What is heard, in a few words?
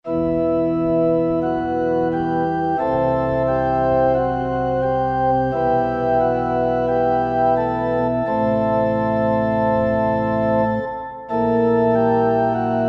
OR Pipes, Yamaha TG77; Factory Presets